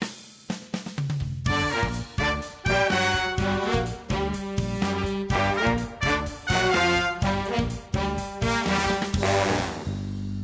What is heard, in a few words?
Battle